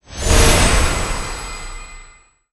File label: Level up